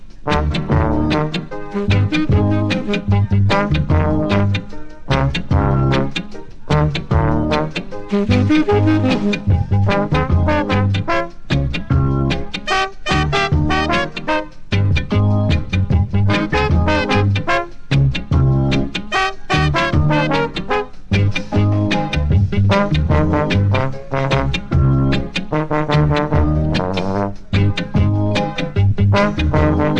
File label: reggae